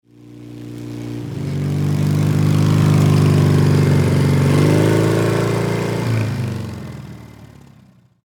Vehicle Motorcycle Pass By Bmw R71 Military World War Ii